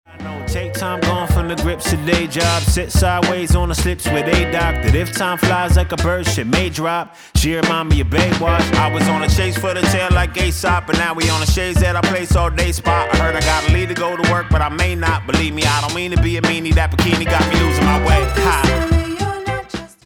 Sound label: Hip hop master Unprocessed